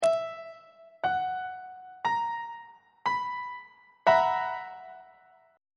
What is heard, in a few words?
a double sharp major 7th suspended 4th 1st inversion on treble clef